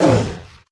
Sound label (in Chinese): 北极熊受伤声